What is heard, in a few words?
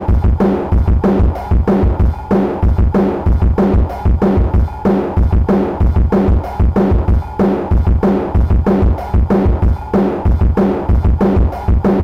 drums stereo res